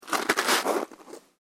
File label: SNOW SCRAPE; Material components for constructing more complex sounds; Sound Effects:Weather